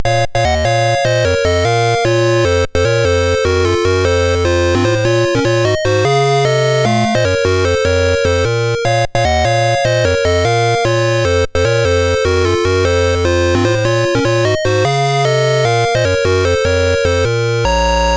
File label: Dragonworld sid MOS6581R4